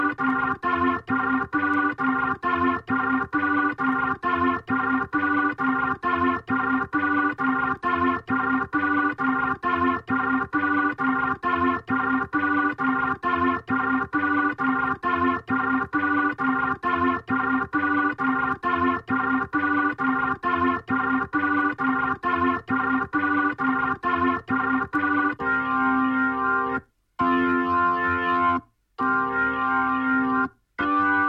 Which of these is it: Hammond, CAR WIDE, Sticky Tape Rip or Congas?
Hammond